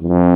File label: LO TROMBONE; East West; Global Sounds